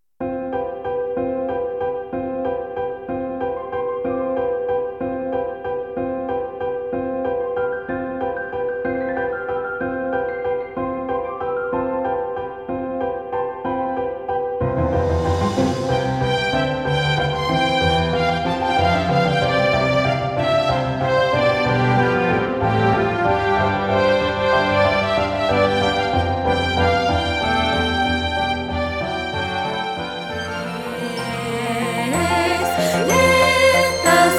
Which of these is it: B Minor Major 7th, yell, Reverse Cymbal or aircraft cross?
aircraft cross